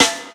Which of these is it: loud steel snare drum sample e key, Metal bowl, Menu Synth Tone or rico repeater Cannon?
loud steel snare drum sample e key